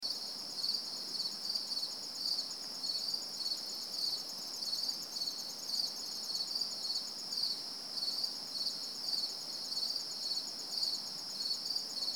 Crickets chirping at South Lake Desor; Isle Royale

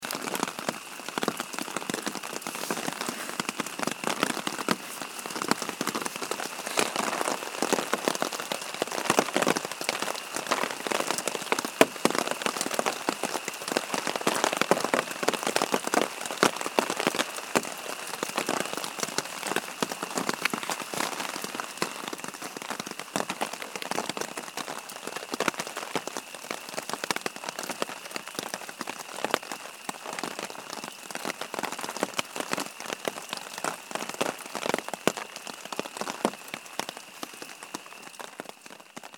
Rain hitting tarp at Cropsey Pond

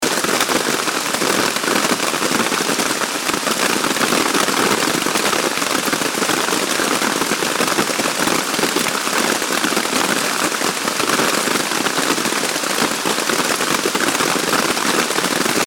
Rain at Lower South Pond

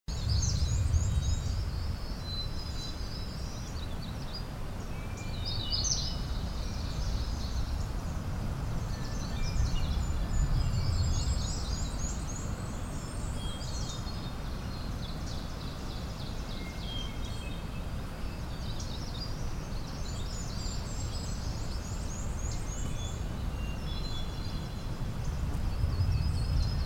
Cropsey Pond Early morning bird chorus

